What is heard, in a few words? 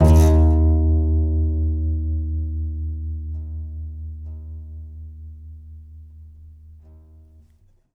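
contrabass balalaika, Plucked Strings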